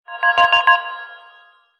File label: Chrome Tone; Motorola Atrix-2